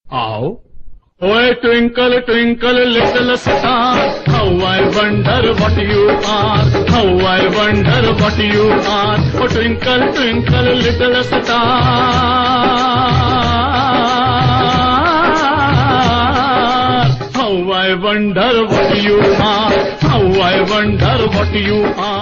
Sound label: Twinkle Twincle Little Star India Style Rhymes Funny